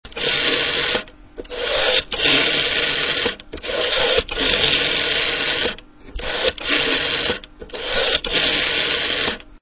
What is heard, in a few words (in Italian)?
telefono disco